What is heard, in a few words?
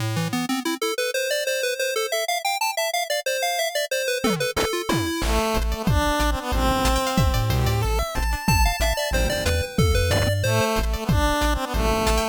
scale comparison minor